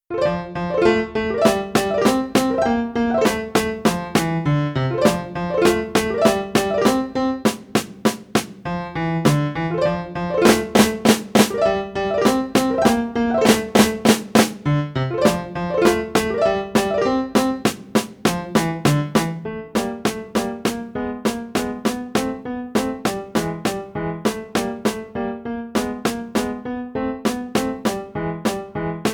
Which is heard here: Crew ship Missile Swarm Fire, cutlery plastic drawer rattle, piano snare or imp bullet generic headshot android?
piano snare